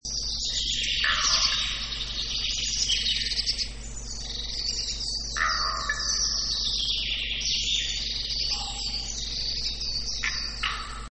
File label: corvus corax